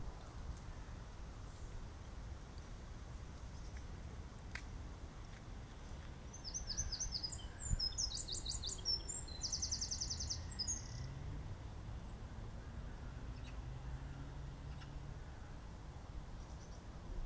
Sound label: birdsong; brandon hill